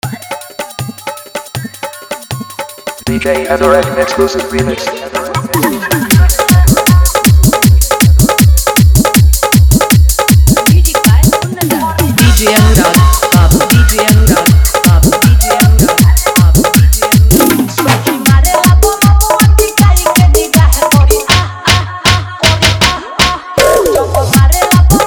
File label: New Viral Arkestra Dj Song Fully Dhollki Bass Mix